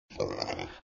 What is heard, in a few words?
Boar Sound; moonstone madness